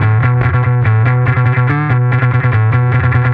Bass

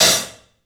HAT, CLUBHAT, 3D Drums; 3D Sound

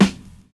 VINYL SD, Drums Dance & Groove

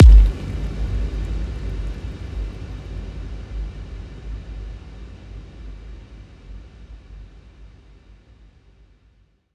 FX Impact Liquid Solid

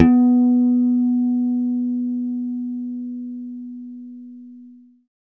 GUIT Harm; Best Service Gigapack 2

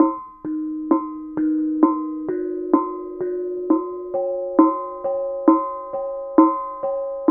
KENONG; Spectrasonics; Heart of Asia; KENONG PH

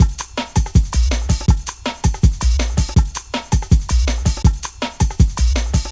Huh Hup, Loops, breaks; m8 breaks

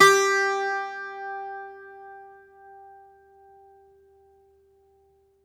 Greek Bazouki, Plucked Strings

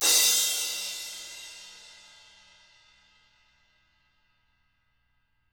CRASH 18

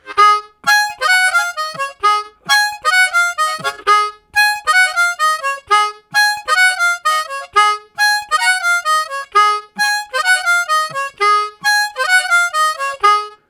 Jump Octaves, Harmonica Riffs; Zero G - Harmonica

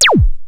MPC 60; Rubber Chicken Software Sample Bank; DRUMS + PERC; AKAI

